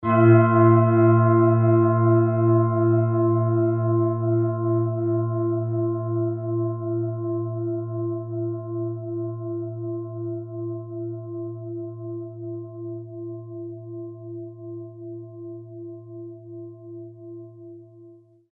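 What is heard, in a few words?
tibetan bowl moonset, A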